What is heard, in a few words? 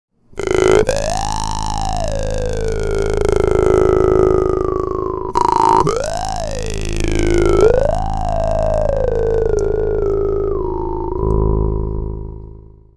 doromb tibet